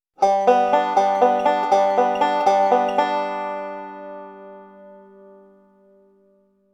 triolen als rolls

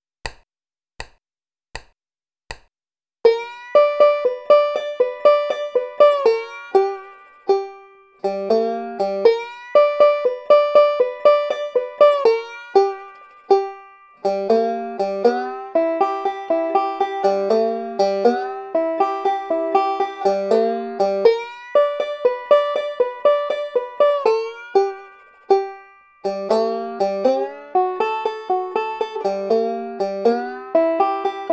blues schema mit bottleneck slides und barre

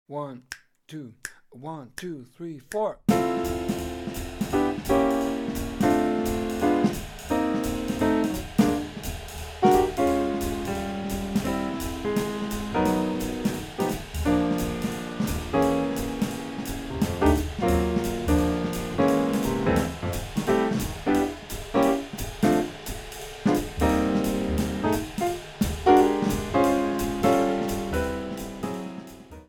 blues in f dur play along; parker

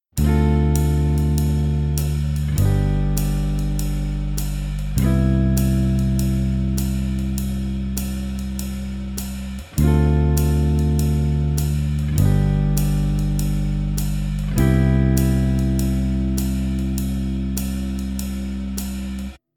ii v i verbindung

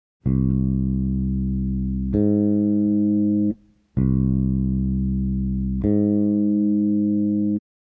kleine Sexte

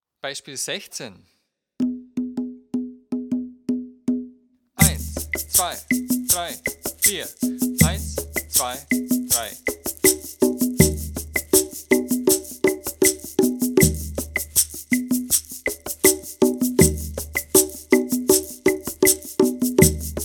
viererrhythmus

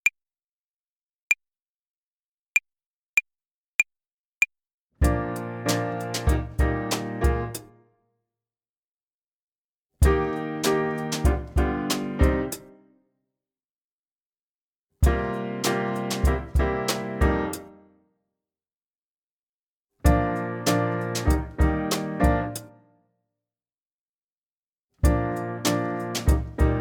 tempo und rhythmus uebung hihat play along

